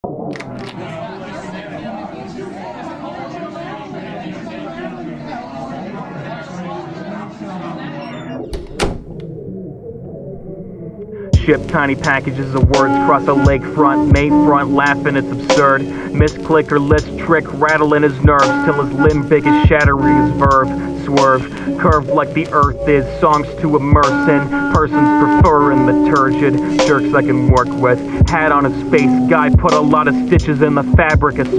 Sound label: Lo-Fi; Pony Music Archive